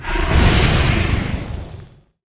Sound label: Lightning Ball Throw; Evolve; Kraken